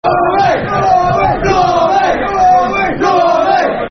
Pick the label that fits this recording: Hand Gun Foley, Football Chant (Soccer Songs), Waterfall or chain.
Football Chant (Soccer Songs)